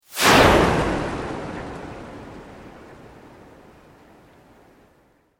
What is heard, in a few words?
Sweep Organic Medium